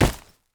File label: Horror Body Drop With Chain